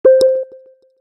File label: Whoop doop, notifications; OnePlus OxygenOS 13